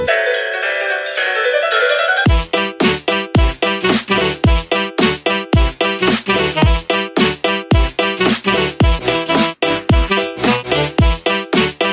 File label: electro swing jive ringtone